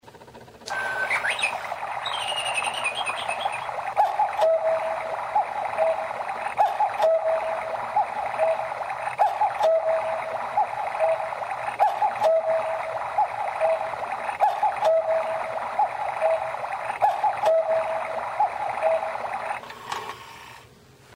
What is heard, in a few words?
cuckoo